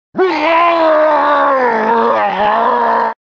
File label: free fall scream; deception; baraka